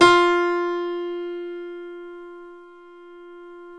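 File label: F3 piano left; Northstar - New Gold VOL-2; BOS.GRAND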